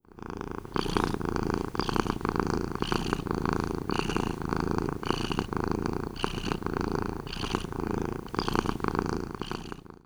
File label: cat purring